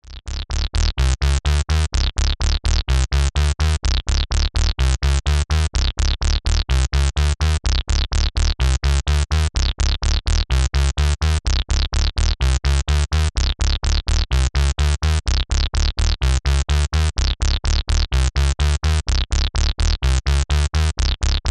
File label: Pulse Res Width; sh101 sample pack